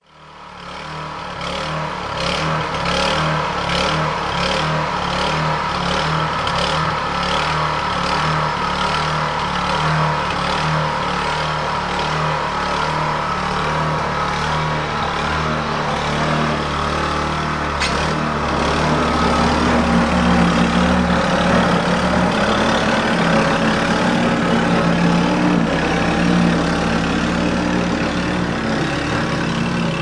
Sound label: Snow Blower